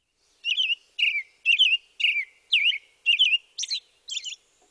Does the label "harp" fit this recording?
No